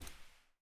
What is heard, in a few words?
crouch slide